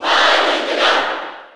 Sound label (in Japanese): Cheer Japanese; Palutena; SSB4